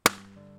風船 割れる

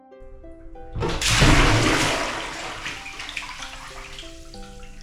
SE 風呂 飛び込む

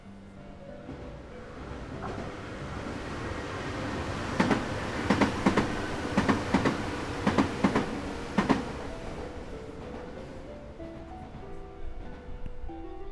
電車 通過; 通過音

